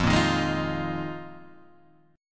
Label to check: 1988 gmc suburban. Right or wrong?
Wrong